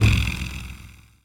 Transition Hit Impact Short

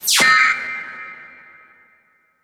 Transition Laser Horn; Production Element

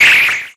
Flash Animation Short; Multimedia Internet CD-Rom Flash

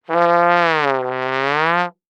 Cartoon Trombone Slide; AdobeCC

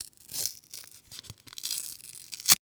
Band Aid Tearing Open; Emergency; AdobeCC

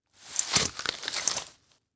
Impact Metal Drop On Leaves

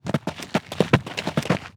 Impact Object Fall Roll Down Dirt Hill; Impacts

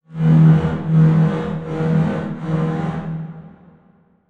Transition Metallic Warble

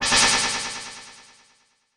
Title Transition Delay Short

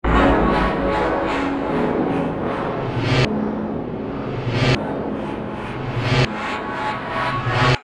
Insane Reverse Hits Mocking; Adobe; Production Element; Imaging

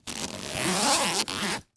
Household Luggage Zipper Un-zipped

